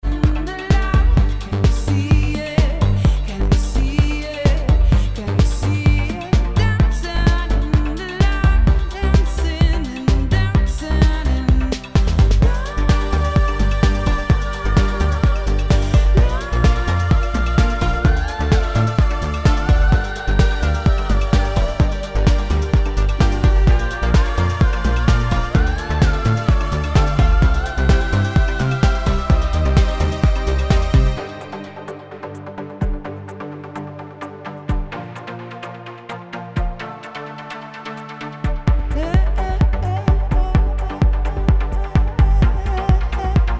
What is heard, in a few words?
Mix Buss Dance Track bypassed